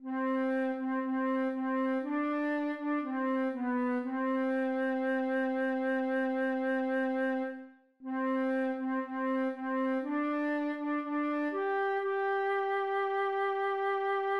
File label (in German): Bele Mama C-Dur nur Melodie